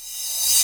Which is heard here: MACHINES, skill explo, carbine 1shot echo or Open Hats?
Open Hats